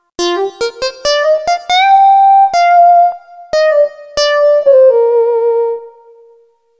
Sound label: Electro Rezo